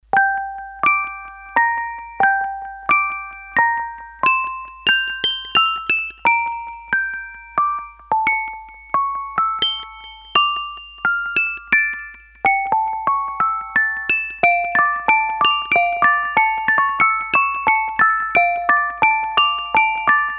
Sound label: Celeste, Korg DS-8; Factory Patches